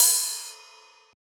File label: Ride; FLPRO